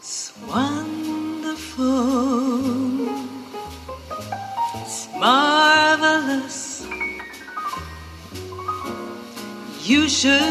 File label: JAZZ